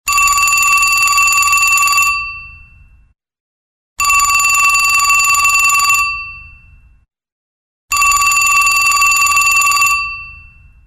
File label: antique phone, ringtones